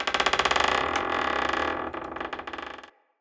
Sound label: Structure Wood Creak Small; Ambience; Fort; Dendrit